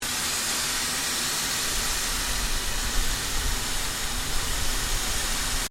Tank Bren Gun Carrier Engine Overheat, British Universal Carrier; Trucks; Vehicles; Foundation4